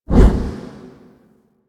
Operator Wraith Void Projection Exit; Warframe; Focus; Powers; Wraith Movement

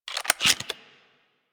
Reload, Weapons; Warframe; Gorgon

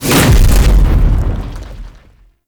Whip Ranged Attack Proj Explosion; Enemies; Weapons; Warframe; The New War; Archon; Nira

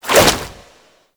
Infested Tendril Whip, melee attack; Zeke; Warframe; Infested Lich

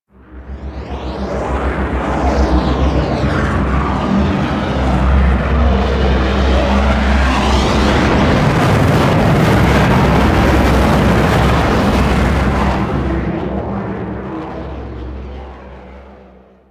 Dropship Fly Out; Warframe; The New War; Narmer Outpost

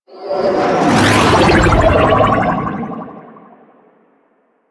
Insect Liset Extraction Arrival, Vehicles; Liset Flight

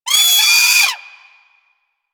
Sea Bird Vocal Death; Warframe; Hunting Critters; Ostron Sea Bird

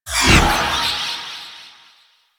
Wraith Throw; Enemies; Warframe; Zariman

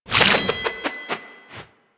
Inf Boomerang Idle Spin A; Warframe; Weapons; Infested

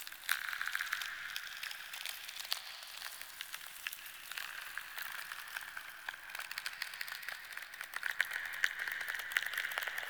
Lair Chatter Loop Large; Warframe; Ambience; Sentient

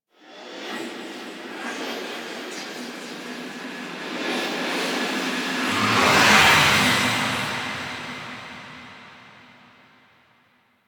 Nursery Queen Echoes; The War Within

